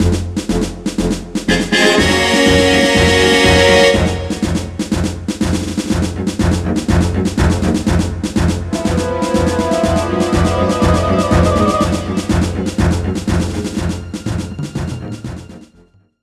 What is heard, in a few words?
Music Attack Sting